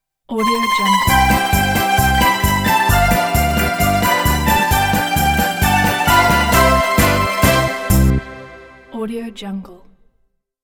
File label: italian logo opener; bequadro